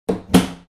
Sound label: door